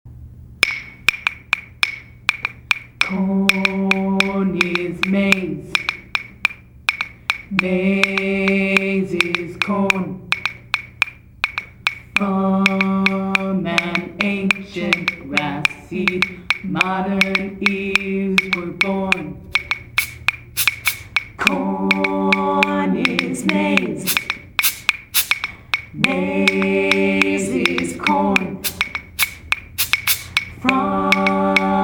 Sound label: Ancient Corn Chant